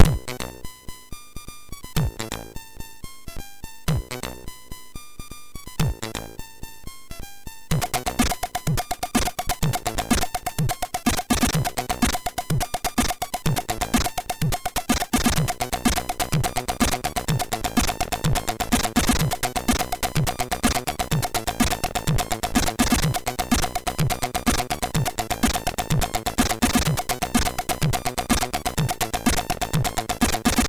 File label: Latin, MOS6581R3